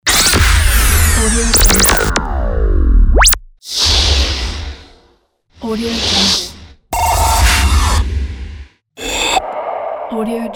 radio imaging pack sound effects

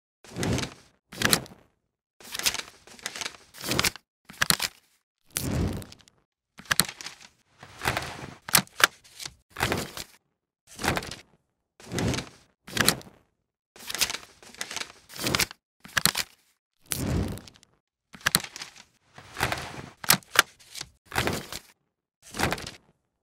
film burn transitions sfx

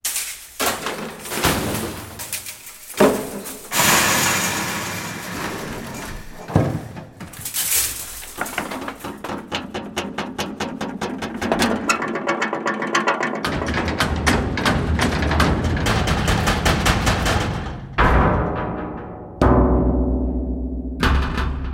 metal movements sound fx; just sound effects

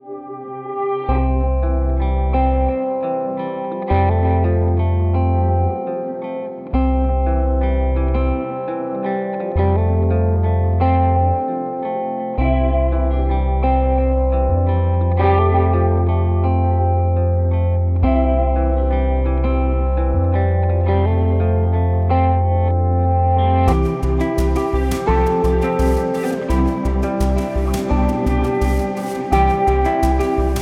ambient cinematic guitars

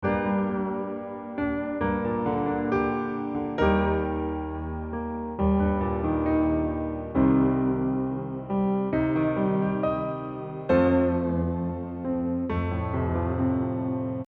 old keys music

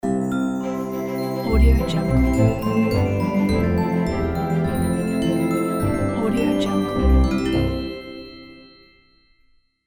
opening logo

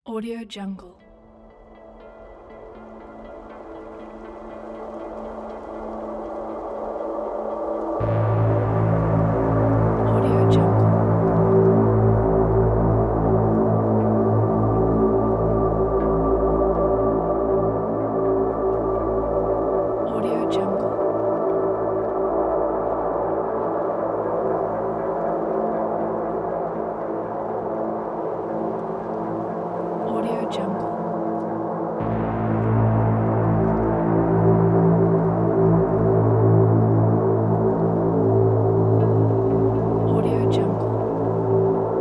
creepy horror ambience music

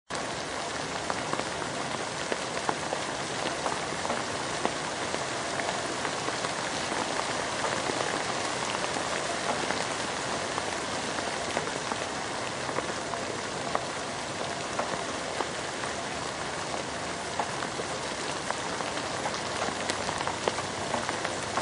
rain library stimulating effects

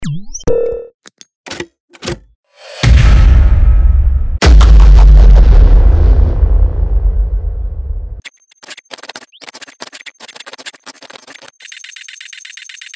echo sound effects pack

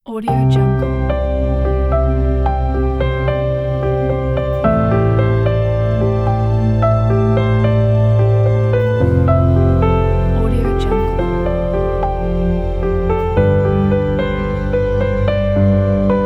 wedding emotional music

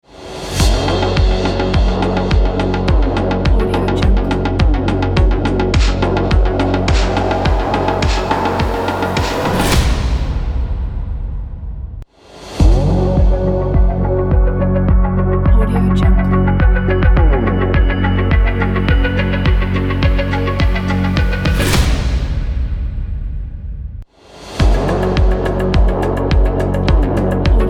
electro epic tech logo music

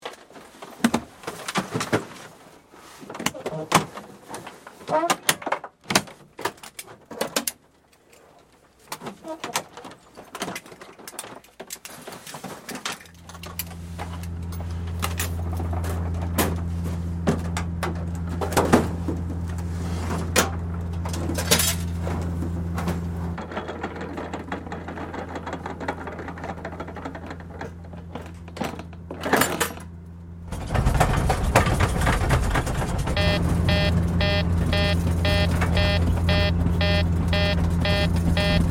interior airplane sound fx; big room sound

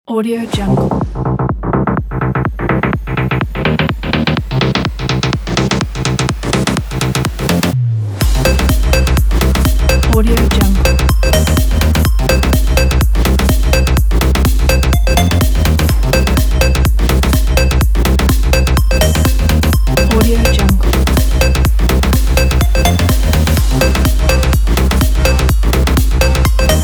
electro sport trailer music

